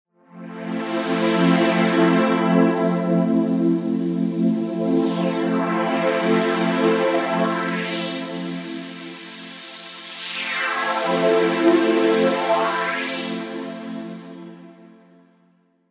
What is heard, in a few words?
Hydrasynth; phaezra